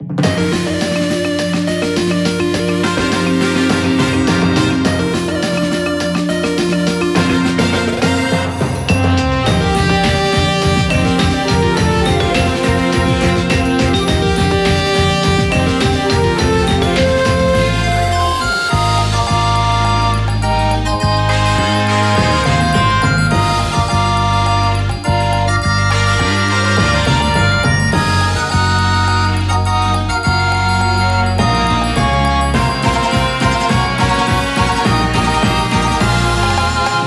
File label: COLISEUM